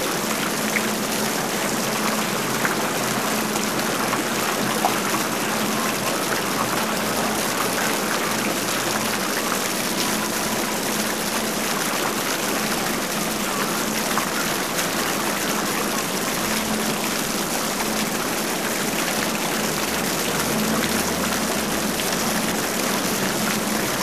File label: fountain; corporate; titan fountain; Titanfall 2